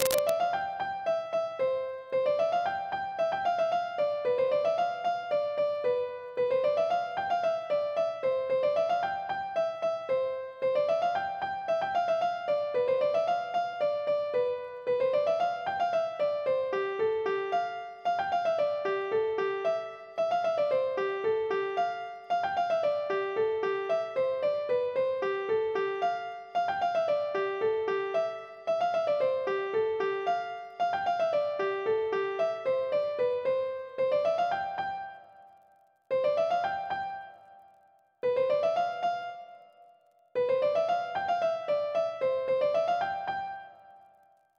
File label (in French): Melodie; vaqui lo mei de mai